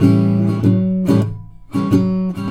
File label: RIFF, Blues Guitar; ILIO; Fretworks; SIMMS